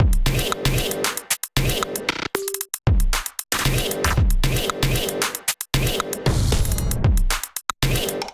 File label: Kit groove 115 bpm, Drum; MIDI Clips; Undercurrent Kit groove; Ableton; Factory Packs; Drum Essentials